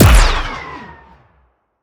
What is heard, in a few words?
Sciweap Shot Medium Gun Rifle Ripper